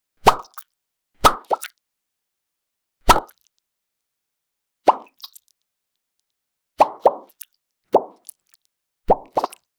goofy portal pop with a

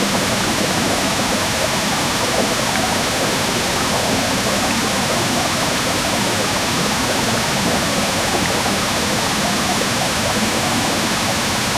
looping melody to accompa

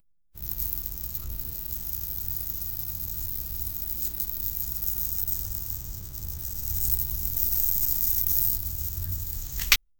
cactus pricking sound

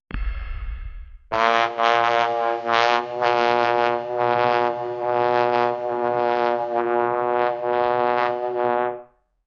terrible voice of trumpet; brass

